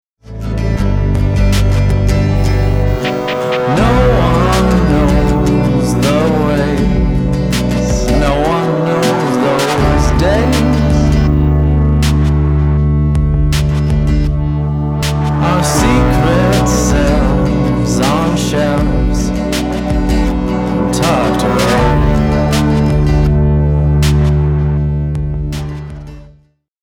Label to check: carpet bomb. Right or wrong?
Wrong